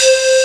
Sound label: PANPIPE C4